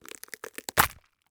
Horror Scary Cheek Ripping Off

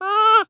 Animal Mammal Herbivore Beaver Call; animals; AdobeCC

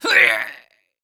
Human Male Yell Grunt In Pain Yell

